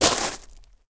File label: rocks land; Golden Crayon Sounds